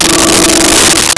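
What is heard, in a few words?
Distortion Guitar E4; Nintendo; July 2020 Gigaleak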